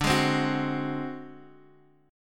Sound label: D 7th Flat 5th, Guitar DADGAD